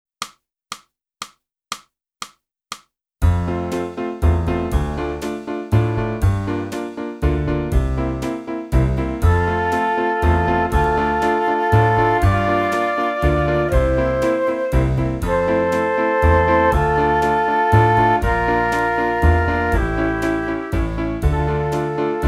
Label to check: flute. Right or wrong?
Right